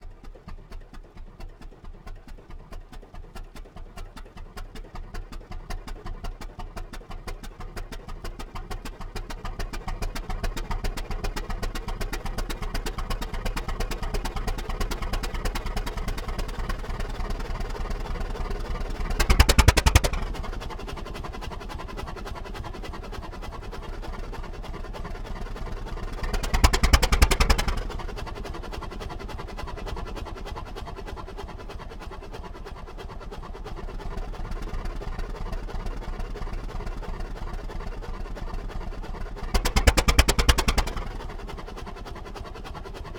Steamengine FREERUN coal accelerations and decelarations airy thuds and aggressive pufs; On The Fly Audio; Steam Engines Real & Foley